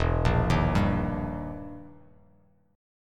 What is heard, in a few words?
E Minor Major 7th Flat 5th, E, down; arpeggiated; JGuitar